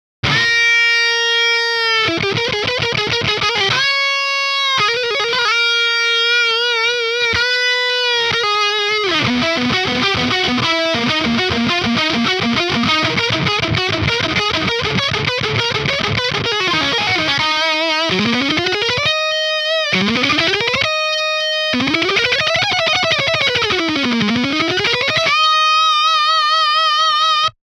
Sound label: OVERDRIVE LEAD Mono; SaturnLO